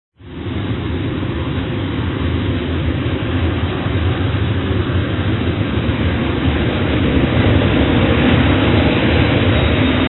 engine start, plane

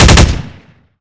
fire burst, weapons; ar2

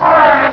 throw, zombie poison

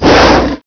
penta attack

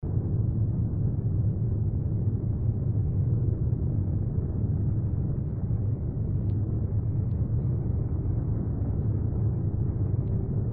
ambient; ns training

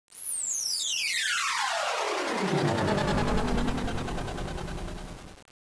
teleport out

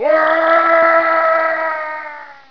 fall

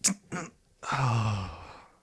Morphine Injection; police

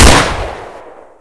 m4a1 fire, weapons

